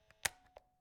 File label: cassette tape motor